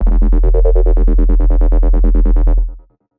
Bassline, Dubstep; GhostHack

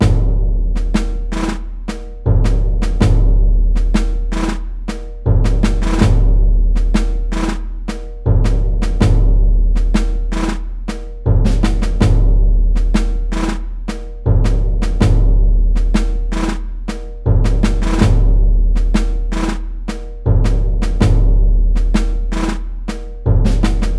Low Drums - 80BPM; Percussion Kits; STEMS; Ghosthack; SkyRealm; Kit 05 - Fallen Tribes

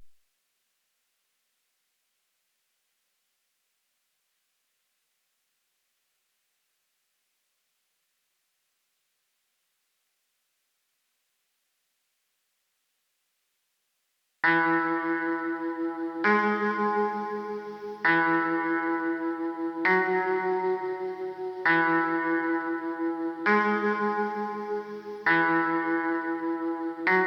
133 BPM C# Min Guitar; Trap; Melodies; Loop Stems; Cymatics; Trap Starter Pack; Harm's Way

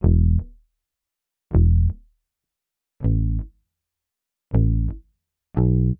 80 BPM E Maj Bass; Loop Stems; Oceanside; Gems Free Teaser Pack

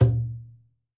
Bobobo - A#, Percussion; Cymatics - Infinity Beta Pack 2.0; Zeus Percussion Collection

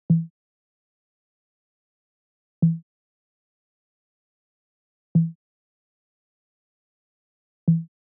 Drum Loop 95 BPM Tom, Afrobeats; Loop Stems; Cymatics; GHOST (Beta Pack); Cruise Drum Loop

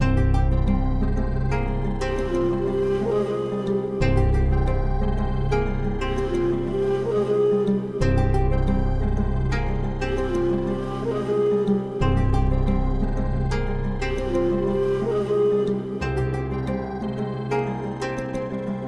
120 BPM C Min, Melodies; Bushido; Cymatics; Immortal Production Suite Beta

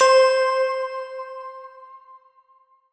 BELL, C; Melodic One Shots; Feel

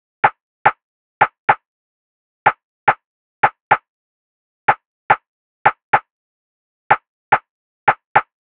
Drum Loop 108 BPM Rim, Afrobeats; Cymatics; GHOST (Beta Pack); Banished

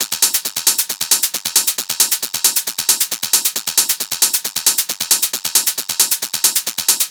Hat Loop 135, Techno

